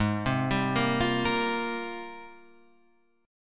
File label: G sharp Major 13th, Guitar Half Step Down